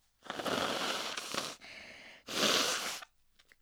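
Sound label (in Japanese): 呼吸音（鼻をかむ）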